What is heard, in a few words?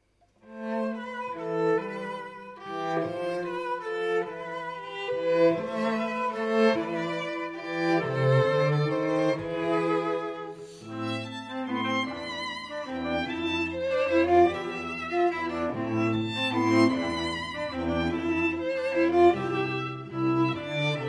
waltz strings